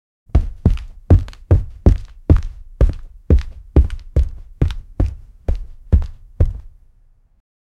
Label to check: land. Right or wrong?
Wrong